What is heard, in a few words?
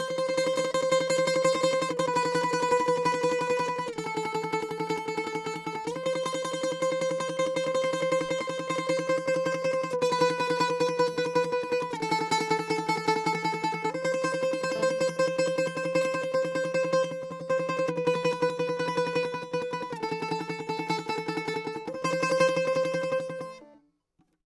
bouzouki